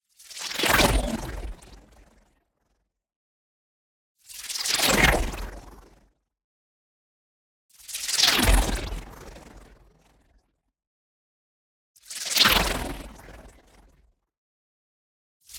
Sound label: NEON WHOOSH SMALL FAST Swirling Projectiles; boom library